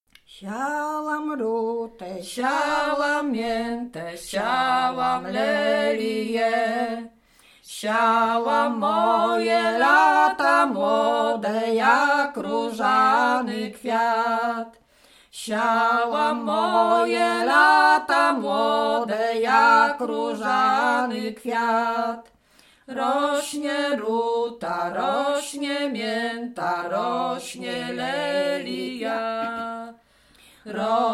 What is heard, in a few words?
duet; Siałam rutę